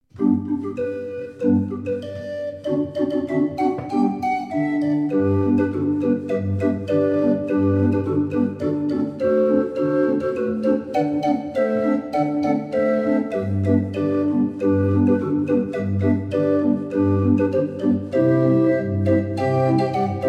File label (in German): 26er drehorgel